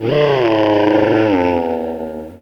yeti die; wesnoth

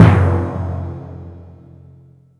bell; wesnoth

